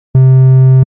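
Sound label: triangle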